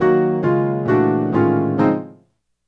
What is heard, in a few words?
Piano, Acoustic Pianos; Sonic Foundry (Sony Creative Software); Mac Money